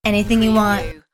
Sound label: Lady Vocal